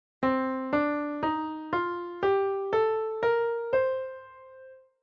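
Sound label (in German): Tonleiter C Dorisch; Weitere Skalen; Tonarten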